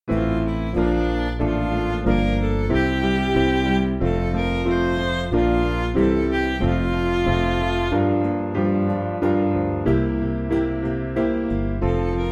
Music Backing Files for Churches; Gentle Jesus

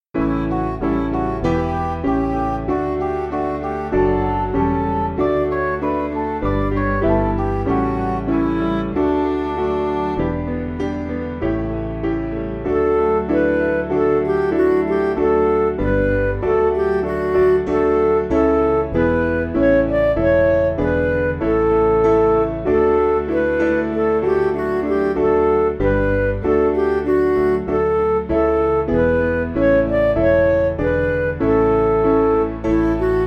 Midi; Sicilian

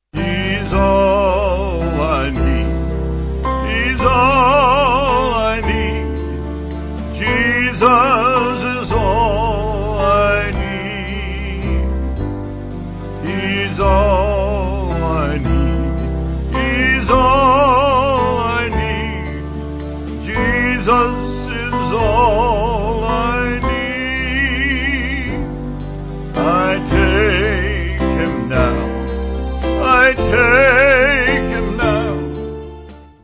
Vocals Band

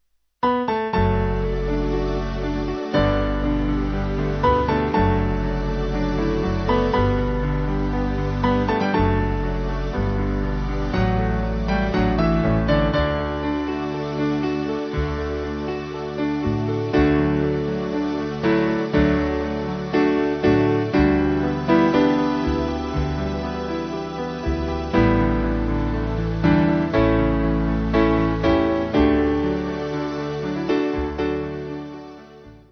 Band

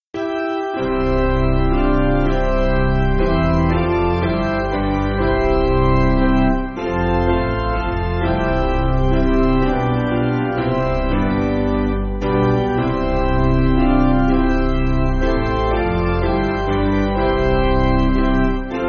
Piano, Music Backing; Basic Piano & Organ (CM)

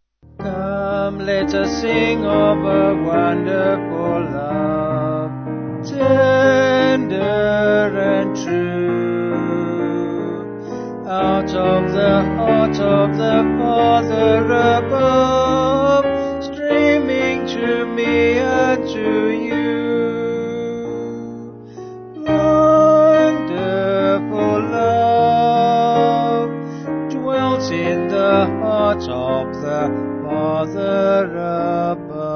Vocals Piano; Come Let Us Sing

